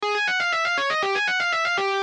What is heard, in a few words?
singing